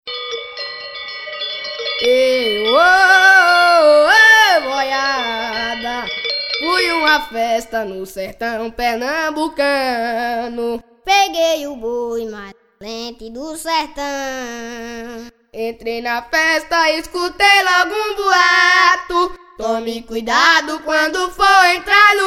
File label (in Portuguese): toada